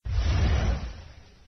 submenu; dot adventure

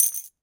coins; ludo

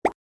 button; surf riders2

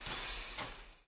robot stop; steam camp

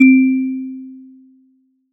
first button; puzzle blocks

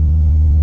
car; driver highway2

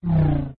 car spawn; traffic command

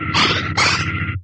attack; dexomon2

